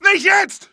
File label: hit, pain; mohaa